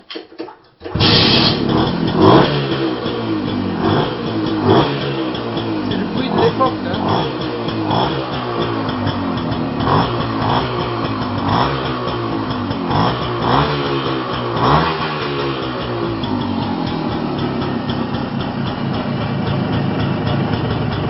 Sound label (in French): moto